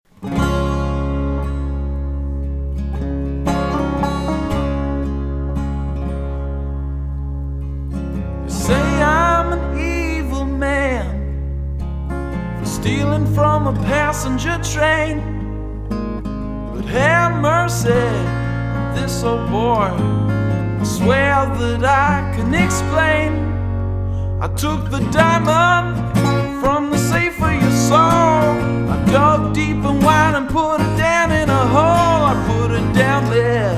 acoustic version

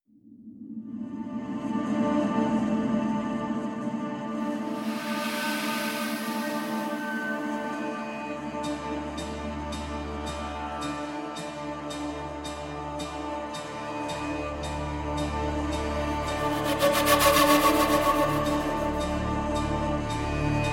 pads only

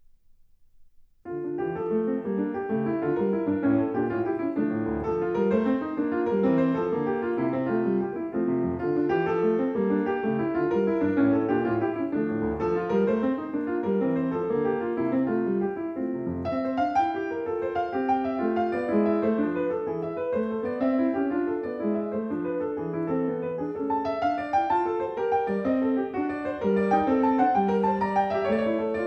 keyboard suite in d minor gigue

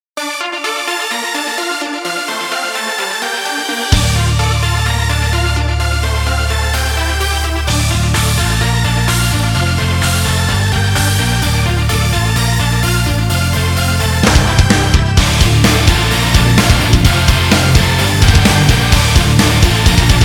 instrumental

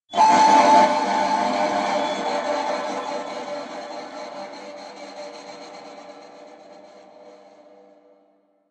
cutting horn

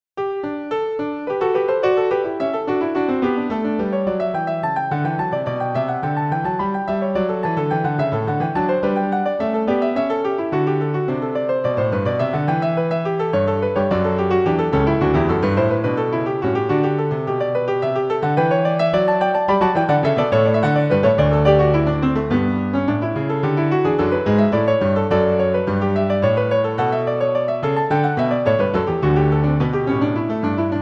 allegro instrumental

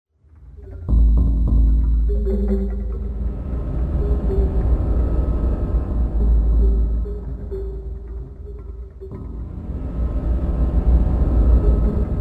weird sounds of forest

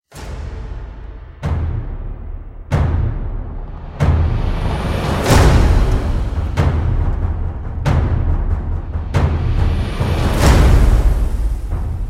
drums

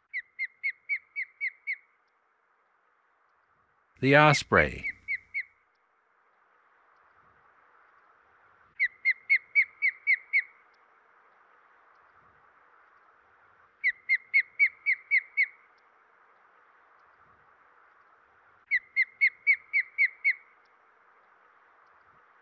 osprey; dan gibsons solitudes

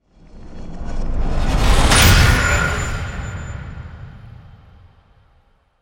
hit swell; Ninja Tracks; scald

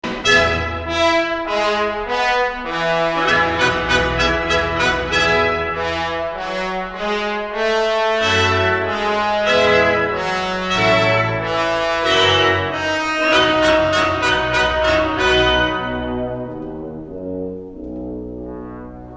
trombones and tuba allegro pomposo, orchestra

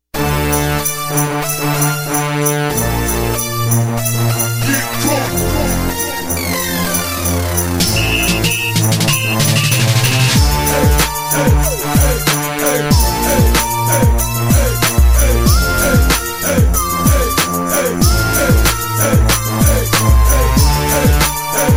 christmas time instrumental

